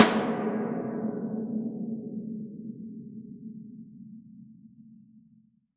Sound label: Snare Shard; ZeroGravity Drum Shards